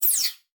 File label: Multimedia Internet CD-Rom Flash Blast